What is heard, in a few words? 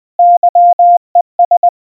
YES, -.-- . ..., Морзе; Утвердительно; Генератор азбуки Морзе